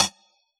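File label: hi-hat FX, Yamaha DJX; 155 DJX Kit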